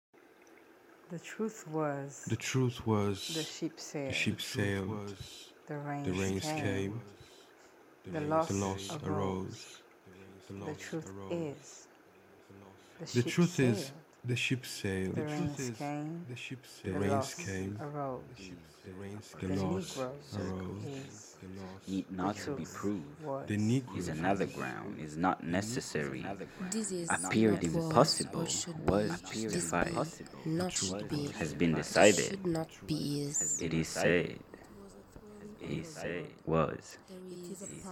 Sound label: chorus